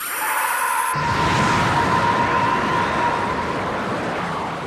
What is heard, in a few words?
scream